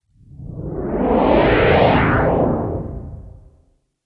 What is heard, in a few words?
Sfx Tennis